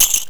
ADD GOLD; luxurycar

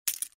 jetton single

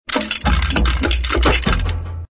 sound water compare rock; watermargin

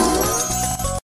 fish gold

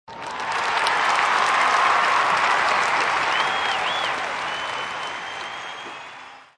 Wild Cheer; hockey

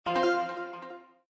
Wins Individual Line; slotssister

